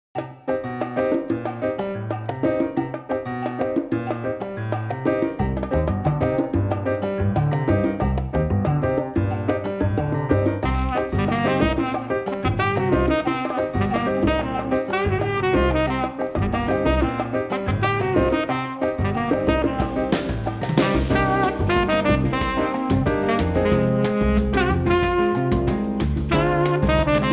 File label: Fast